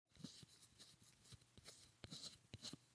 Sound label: sun glasses wipe